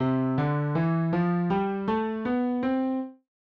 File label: doremi